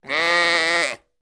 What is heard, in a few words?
Sheep Baa